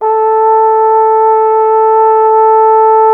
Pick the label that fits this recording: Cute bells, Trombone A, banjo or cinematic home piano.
Trombone A